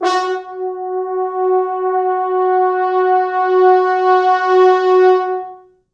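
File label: 4 French Horns, Horns; F#3; East West; Quantum Leap Horns Sax; 4 FrHorns MOD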